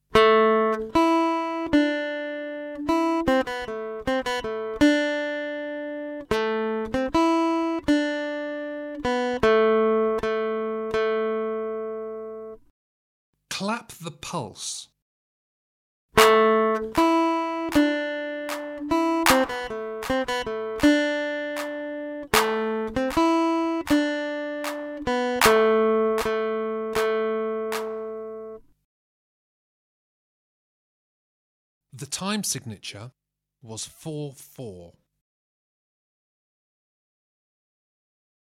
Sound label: Aural Rhythm Test, acoustic guitar; Grade 5